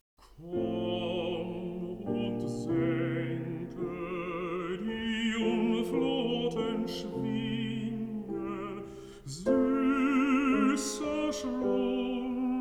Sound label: song for voice & piano